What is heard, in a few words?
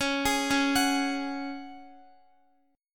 Db Suspended 4th Db, Guitar; arpeggiated; x,x,11,13,13,13